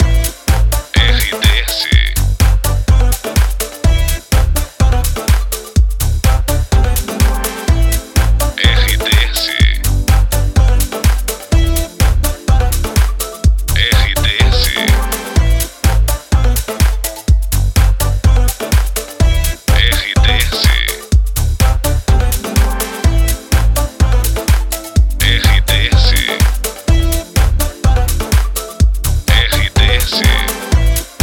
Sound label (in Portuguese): TRILHA PARA LOCUCAO; CLUBE FM PIRAPORA; Pacote Rádio Clube FM, Pirapora/MG. Emissora com perfil Jovem